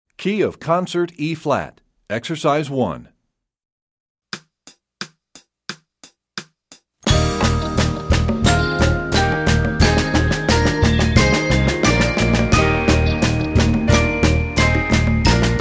Concert E flat, Percussion; EE2000 Book1

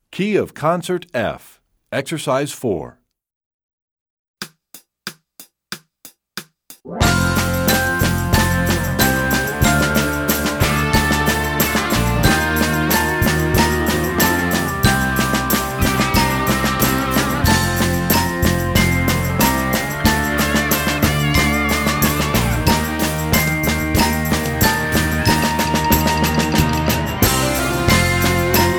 Concert F, Percussion; EE2000 Book1

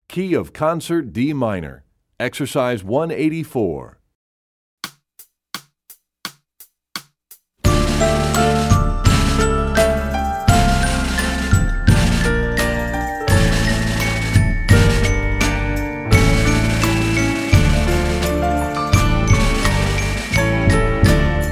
Studies Concert D Minor, Percussion; Rubank; EE2000 Book2

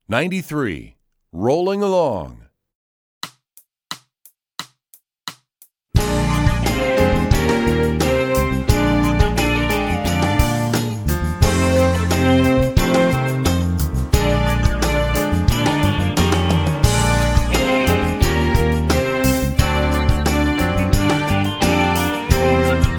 Rolling Along, Strings; EE2000 Book2

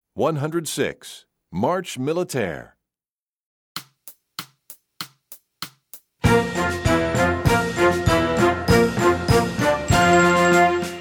March Militaire; EE2000 Book1

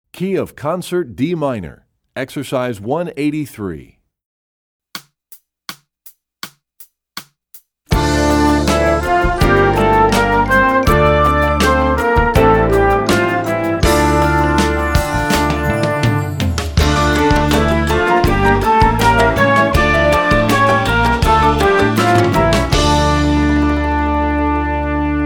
Studies Concert D Minor; EE2000 Book2; Rubank

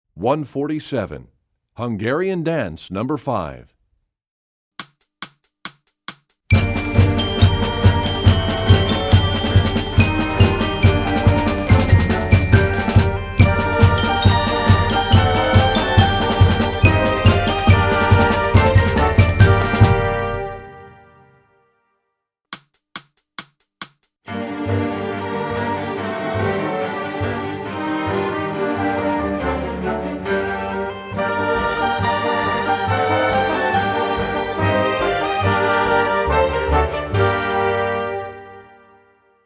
Hungarian Dance No 5, Percussion; EE2000 Book2

